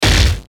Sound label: Hit Static Fuzzy Industrial; Blastwave